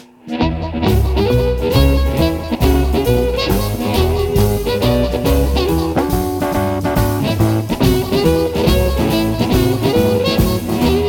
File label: boogie